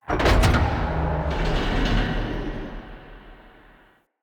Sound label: Wall Gate Start; Movers